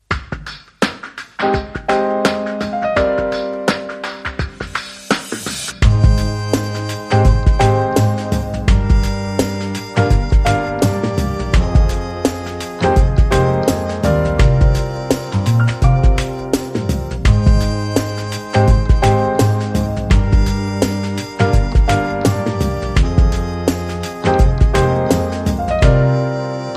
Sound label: Cool and Funky Jazz Underscore; 30sec